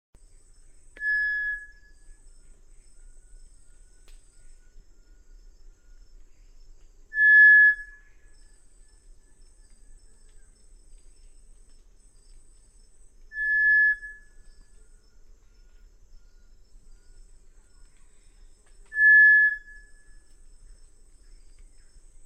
Canto da inhambu pixuna

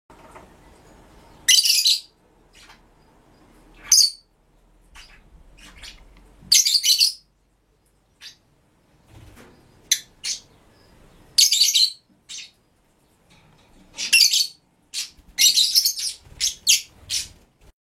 canto do periquito de asa preta

